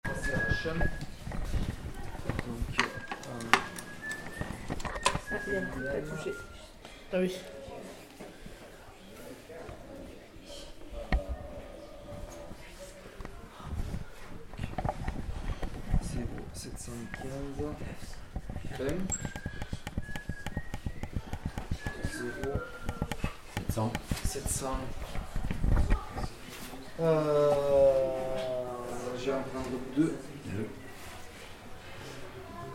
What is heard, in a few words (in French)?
AMBIANCE NON IDENTIFIEE; PEUT ETRE PASCALE KUTNER